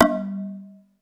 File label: PERC G2; SEQ AND PERC; Music Store - Claudius Diemeter Schwerlos